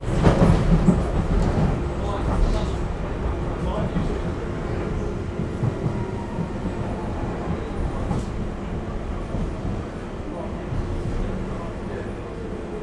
transportation train DLR london traveling between stations